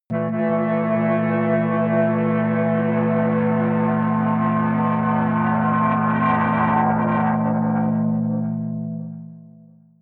atmospheric feedback synthesizer long medium, drones